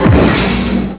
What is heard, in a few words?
break Sound